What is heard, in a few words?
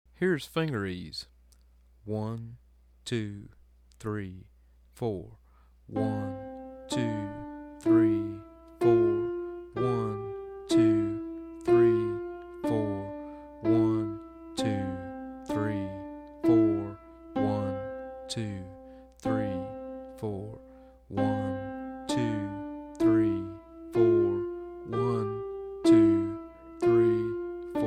Finger Ease Slow